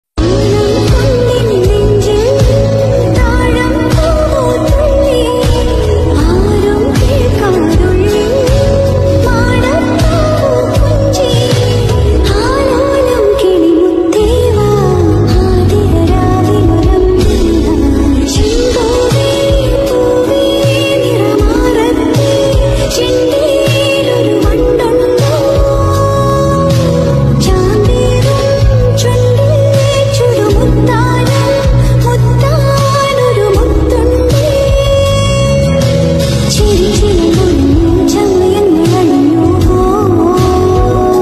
remix ringtone

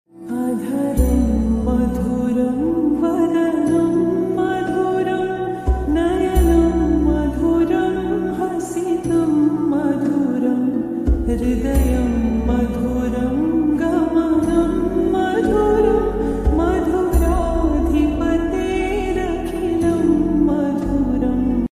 lofi slowed ringtone; shri krishna

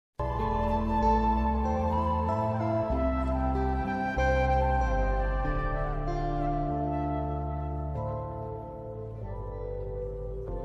climax ringtone bgm; Premalu

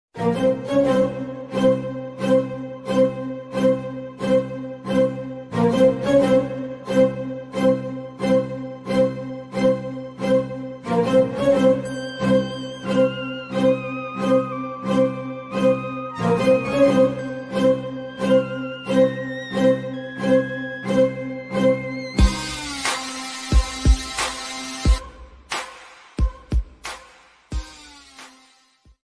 theme bgm; mankatha

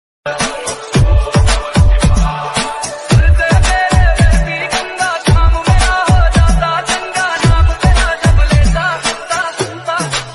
Mahadev ringtone hindi song

